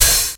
low treble open high hat c key, Royality free open hi hat one shot tuned to the C note